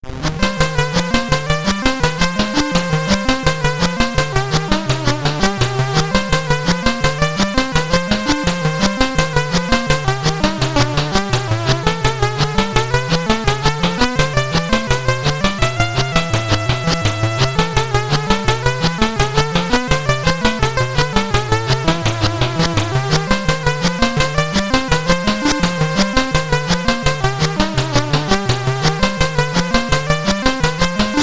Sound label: Main BGM (SID Stereo); Solomon's Key; Commodore 64